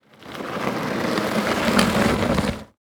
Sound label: Foley Movement Plastic Drag Through Dirt Short